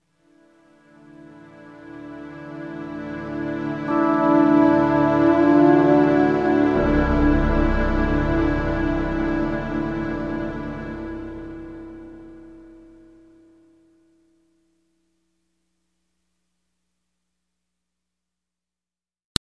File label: landscape